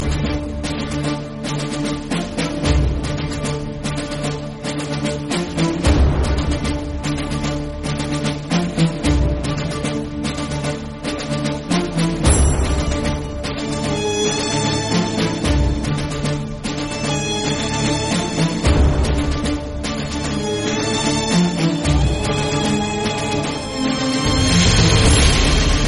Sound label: Theme Music Ringtone; Kireedam